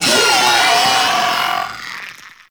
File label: Arachnoid Wraith Vocal Death; Warframe; Corpus